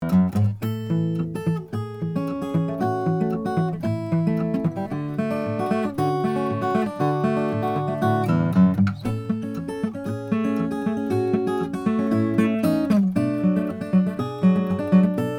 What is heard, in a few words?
bourgeois oms custom koa